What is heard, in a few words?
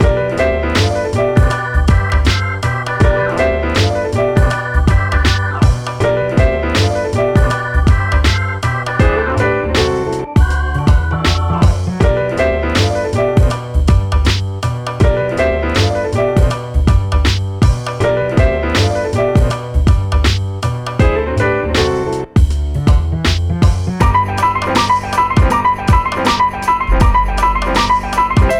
New Bpm80 L; Tanuki